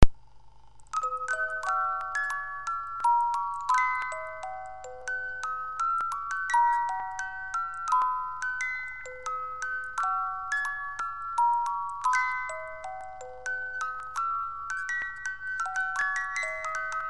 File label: swiss jodel, boite a musique; Boîte à musique suisse, type presse papier